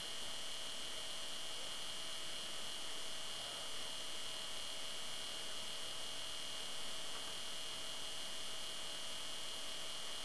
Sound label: idle, noise; aopen5800